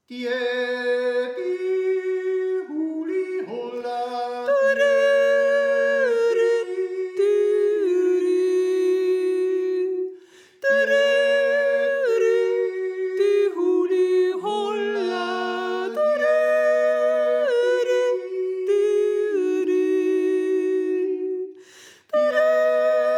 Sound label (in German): Sopran; Liabnstoaner